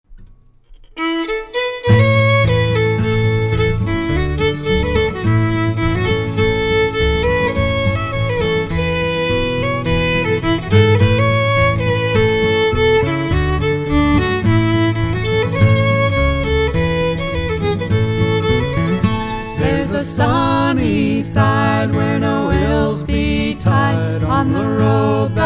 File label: Sunny Side vocals